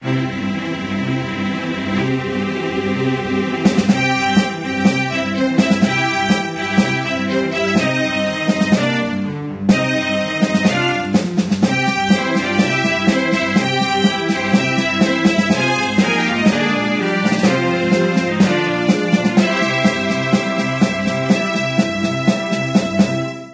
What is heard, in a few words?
Bgm Contest; Pocket Academy 3